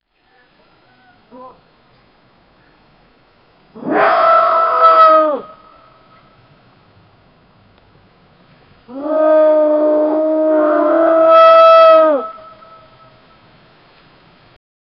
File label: sounds of hyenas roaring; animal calls